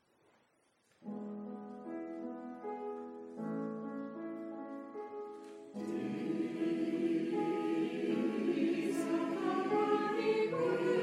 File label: gaelic blessing